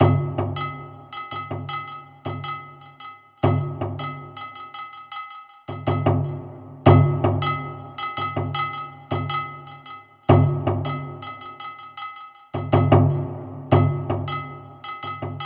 Ding; yager windows xbox gamerip 2003

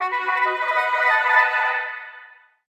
Stadium (Fanfare); super mario strikers gamecube

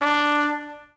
Trumpet tone; lego my style preschool

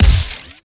Sci Fi Shotgun Fire Heavy; Guntech; Robobeat

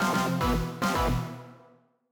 Badnews

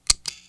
Ammo gun

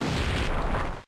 Rock Climb; Pokemon Reborn

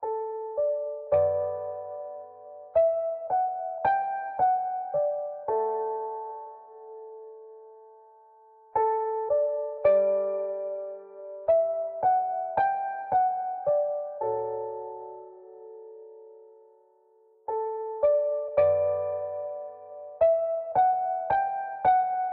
nightmare piano; Syupro-DX; Android

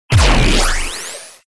Low Cinematic Impact

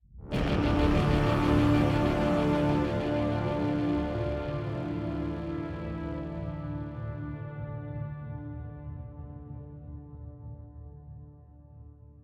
End 190bpm

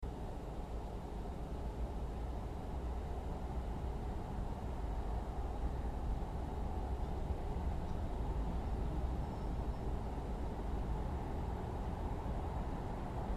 Castle Ambience; escape the backrooms; Level 94

